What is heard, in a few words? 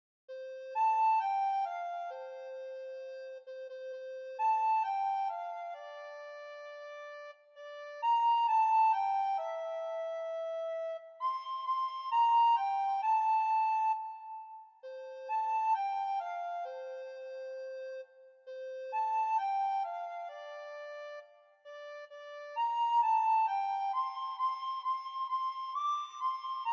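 solo soprano recorder; Jingle Bells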